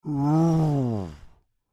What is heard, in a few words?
Creature Moan Exhausted, Human; Groaning; BigRoomSound